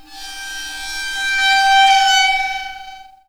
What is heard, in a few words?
waterphone